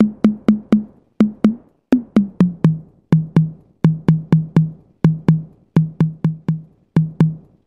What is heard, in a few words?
Ambient House Tom